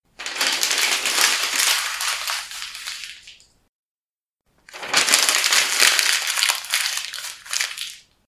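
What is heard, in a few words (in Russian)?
скомкал какие то бумажки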